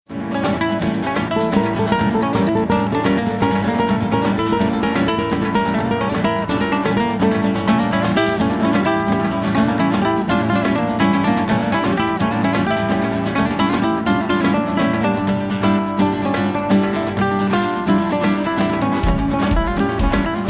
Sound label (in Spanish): ritmo español dos